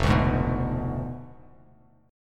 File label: F Diminished 7th F down Bass Standard; Fdim7 strummed; JGuitar